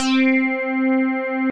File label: Syn Clav Bass; Instruments; Synth Keys; Ableton; Synth Essentials; Operator